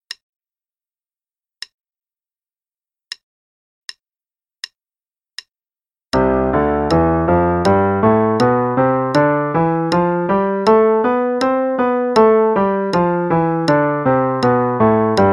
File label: Play along C Major Scale (8ths, qn=80); Scales; Piano